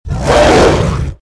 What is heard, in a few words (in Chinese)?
longxiao